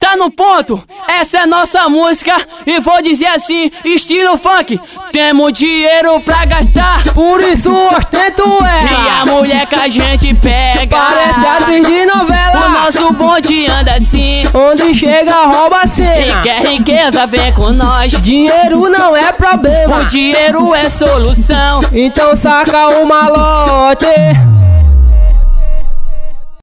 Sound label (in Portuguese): fank